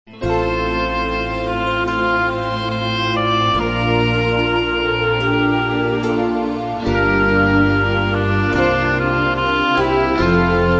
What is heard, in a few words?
Instrumental Hymns